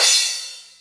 Ensoniq ASR-X Crash

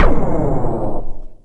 Phase Xplode; Club Techno

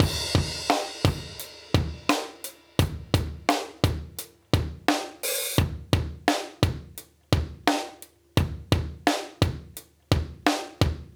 86 Pick Up Stix, Live Breaks

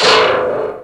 BLAST; East West; Global Sounds

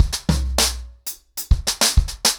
100BPM, ACOUSTIC DRUMS

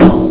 puck; Amiga Soundtracker Sample Packs

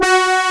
horn G3, synthesizer horn; AKAI S6000 CD-ROM - Volume 1

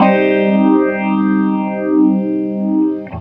HEY, Roots Disco; USB Soundscan vol.32; AKAI

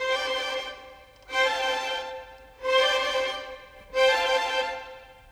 Violins, Orchestral; Sonic Foundry (Sony Creative Software); Orchestral VOL-2; Modern 25

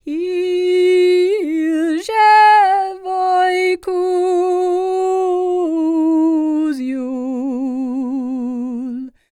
SERBIA, Vocal World Voices SERBIAN

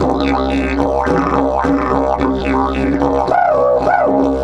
108, DIDGE 108BPM

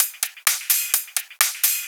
Hihat Loop 128 BPM

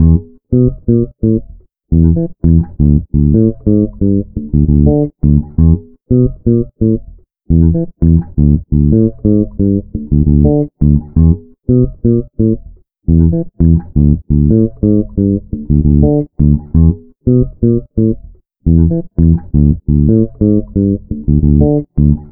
Bass, electro lounge; Sonic Foundry (Sony Creative Software); 086 Sister Strut

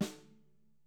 snare, Interactive Drum Kits; Sonic Reality

